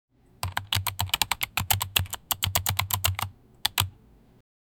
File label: tapping sound of a phone; mobile